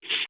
ui light close, slider tones

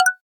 drumset, drums

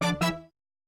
Retro Impact, notifications Retro; Samsung Galaxy S26 Ultra

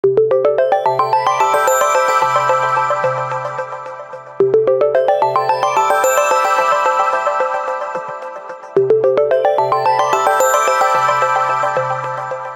Blanket of snow, ringtones; OnePlus 12

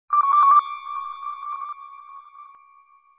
sms

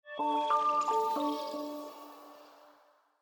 boot audio; Xiaomi; Redmi-Note-8-Pro

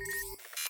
front camera science fiction popuped; Vivo iQOO-Z6

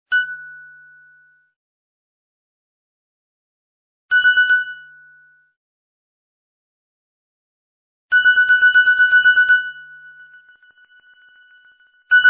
Clock alert; Nokia; 6708

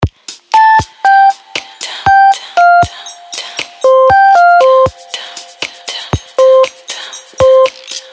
tune, Samsung; SGH-L600

